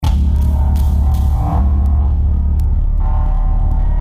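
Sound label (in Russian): trevozhnyj zvuk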